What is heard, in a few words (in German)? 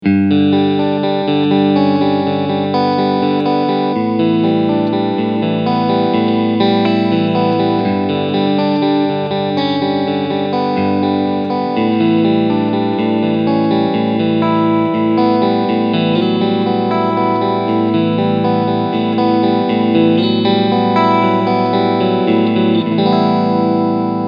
Harley Benton Fusion II HSH Cleansound HB SC Modus